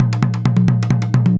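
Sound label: junjunba groove; Best Service; World Colours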